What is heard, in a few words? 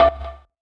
Fx Vox; Equipped Music; Loungin House